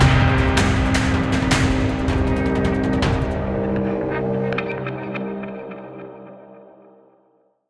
Combat Outro; Gl Rook Combat; Griftlands

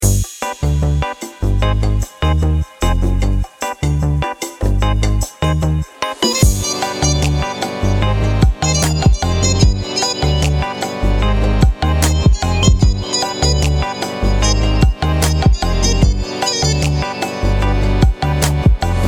Pause Menu

